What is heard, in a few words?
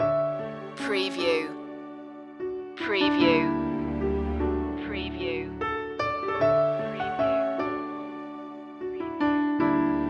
summer showers loop, magical